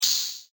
Fuse

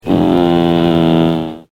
groan

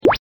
blip

